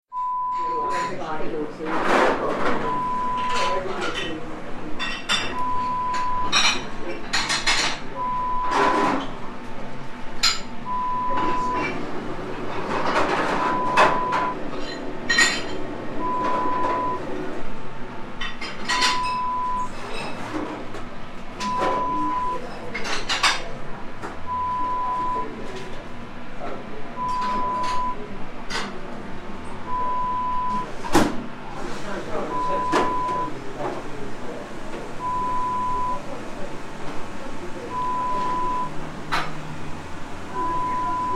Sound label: Kitchen Sounds